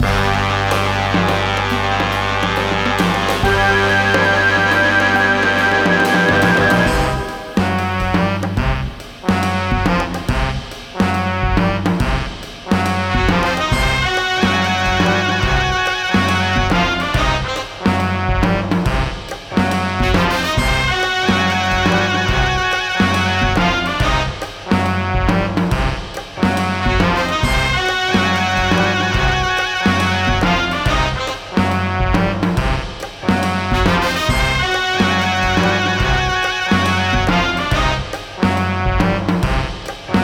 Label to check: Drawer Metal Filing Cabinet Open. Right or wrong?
Wrong